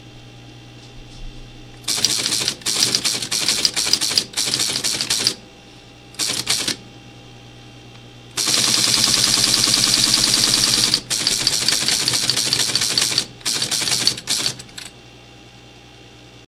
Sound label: punch, asr33